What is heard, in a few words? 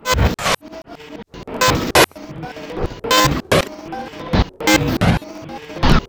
MACHINE